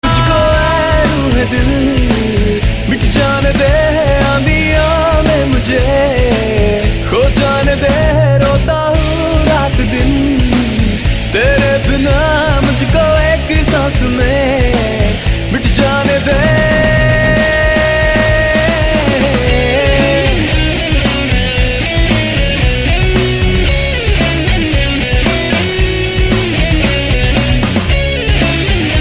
Sad Tone

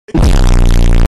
Earrape

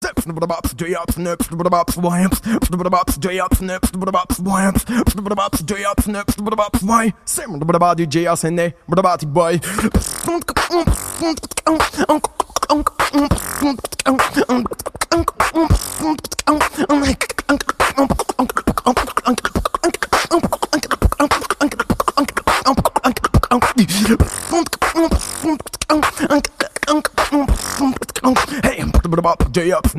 Psytrance

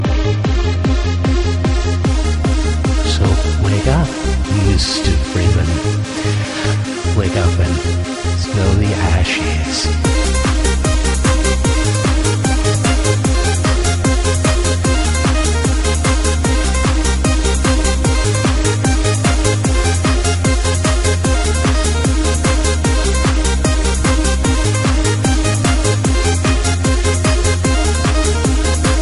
Trancemix; Halflife2

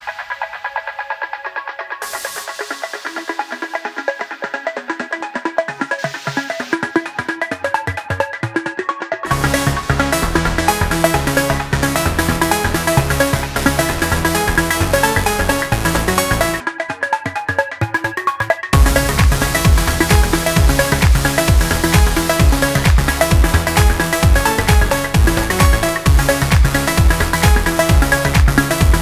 Trance; Armada

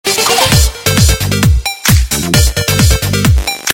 Dancing Tone

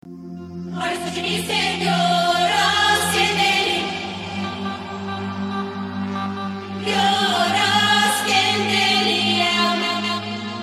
Super Dubstep

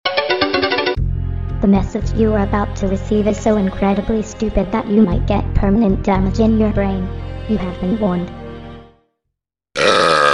Warning Burrp

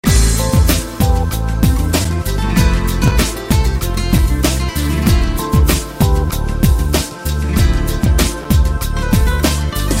Celtic trance

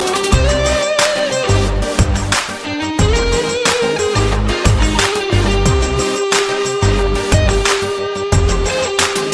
trap

